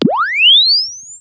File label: Press Two Sides Chirp; Samsung 4 Recordings